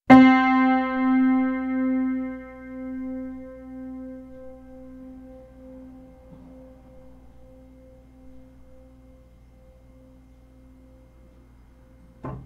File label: Piano C